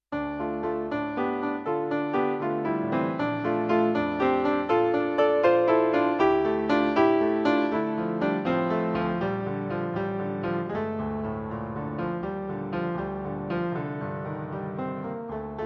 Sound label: piano, solo piano